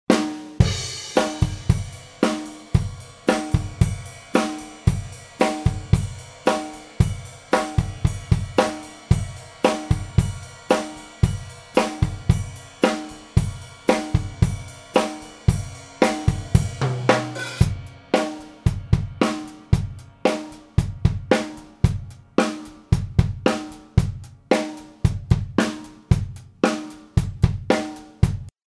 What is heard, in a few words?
Drums Mono DAW 3 Tracks